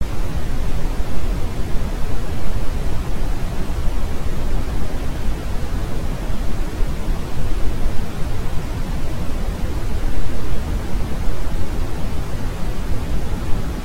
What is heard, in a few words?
brown noise